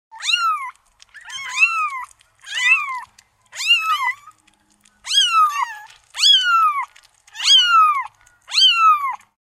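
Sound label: sound of kittens meowing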